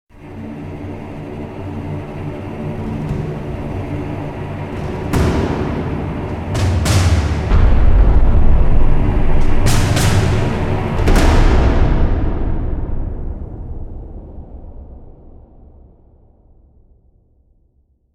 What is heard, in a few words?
magical trailers transition